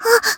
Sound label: combo break